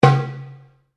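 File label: Drum Darabuka Slap forte, Egyptian Darabuka Drum; Commanly available Egyptian Darabuka Drum; Drums; Sound Effects:Musical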